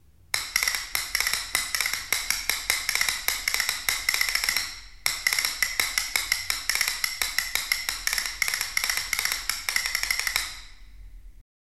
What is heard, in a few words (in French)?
castagnettes